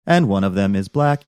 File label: singing and one of them is black